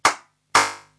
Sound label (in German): Flatterecho; raumakustik